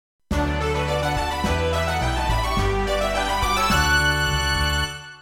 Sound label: fanfare big band jazz akkord; soundtrack